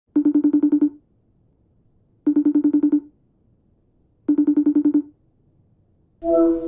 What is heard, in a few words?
facetime call